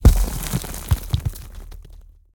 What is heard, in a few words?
soil and dirt debris heavy; fvtt sonniss gdc audio